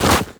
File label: Run Deep Snow, Footsteps; Warframe; Operator